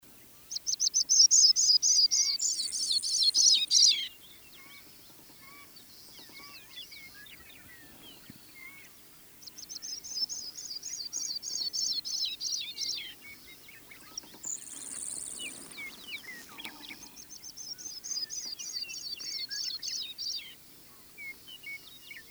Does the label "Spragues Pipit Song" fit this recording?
Yes